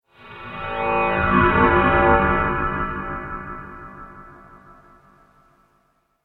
Reveal SciFi Trailer Ominous, Ominous Reveal Scifi Scary Sinister Dark Suspense Evil; Trailers; Sound Design